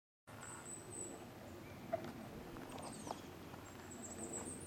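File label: honey buzzard alarm call; belgium eupen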